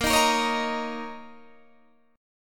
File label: A sharp Minor Double Flat 5th, A sharp, down, Guitar, DADGAD